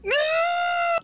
attack; votemap; kani

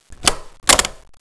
latch unlocked

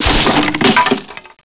bust crate

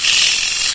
shriek; probe

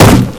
damage wood

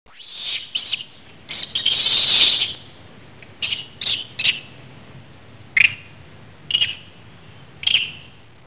rhesus

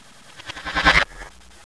saw reload

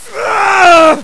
Die; USEC

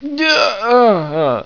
die

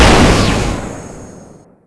electro, weapons shockrifle; ut2004

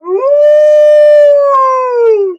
pseudodog howl

